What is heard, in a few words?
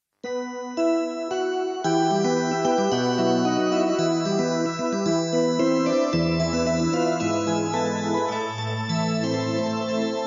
ringtone